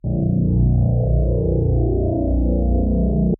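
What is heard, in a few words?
Noise Combed Low Pass Flanged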